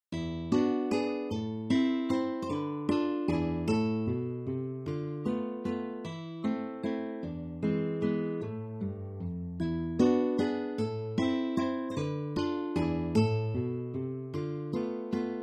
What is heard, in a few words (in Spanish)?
melodia nocturna; sancho jose